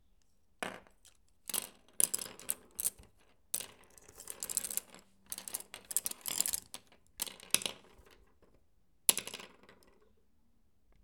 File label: Arranging Paintbrushes